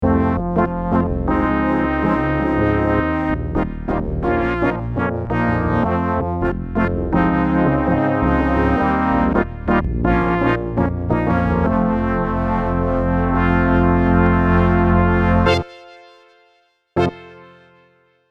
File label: Prophet '08 Oscompad